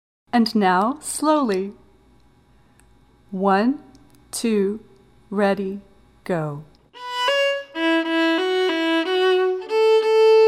slow

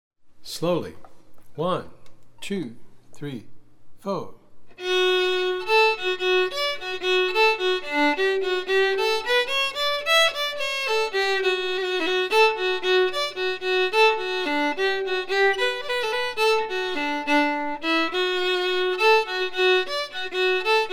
slow

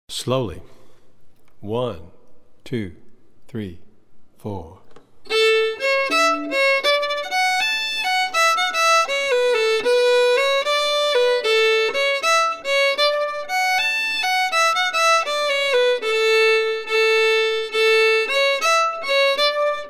Slide slow

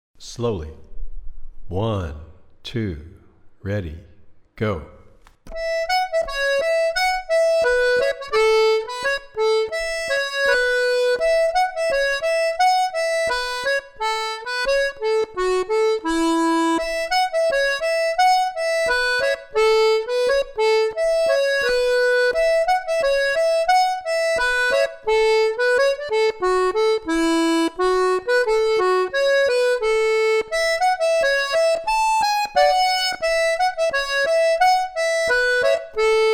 Polka slow

